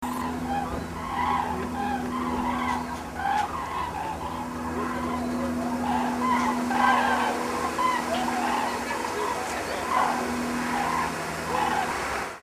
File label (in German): Kraniche